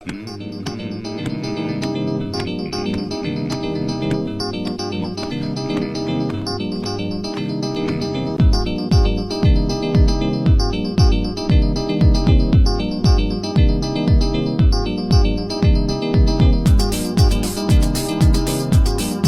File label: background music